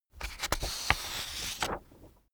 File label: sheet take